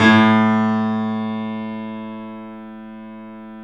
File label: The Piano A; InVision Interactive - The Piano